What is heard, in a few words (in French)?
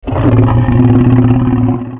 velo